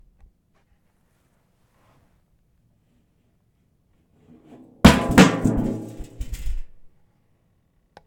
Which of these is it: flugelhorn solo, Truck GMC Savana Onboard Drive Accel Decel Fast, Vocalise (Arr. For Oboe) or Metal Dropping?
Metal Dropping